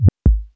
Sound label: beat